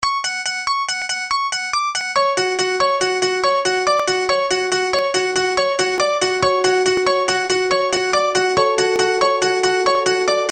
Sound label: smartphone ringtone